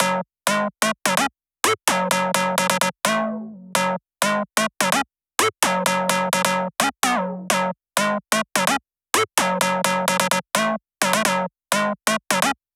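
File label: 128BPM Synth high Add dry noSC, F# minor; Vengeance - Essential Clubsounds Vol.5; Demo Constr Kits; Let's Work It Kit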